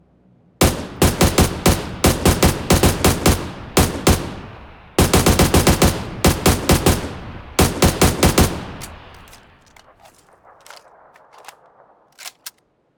AUG single fire

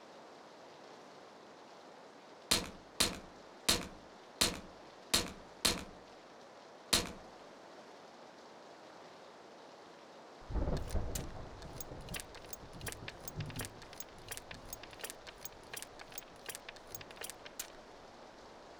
Suppressed R1895

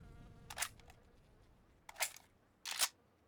Akm reloading

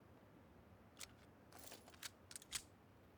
P18c reload

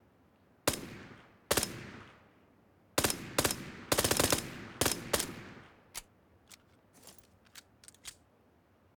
Suppressed p18c full auto

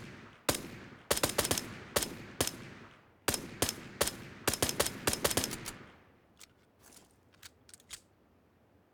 Suppressed p18c single fire

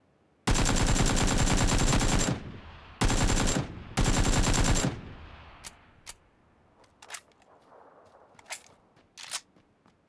Akm full auto

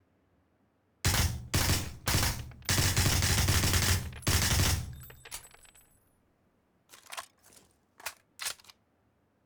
Suppressed m16 burst fire

